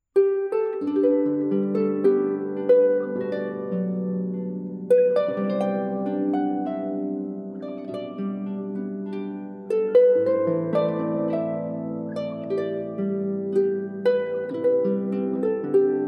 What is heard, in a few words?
Traditional Scottish Boat Song, harp; Loch Tay